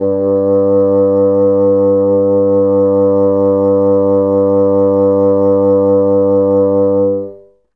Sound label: Bassoon G1; Best Service ProSamples vol.51 - Classic Orchestra 2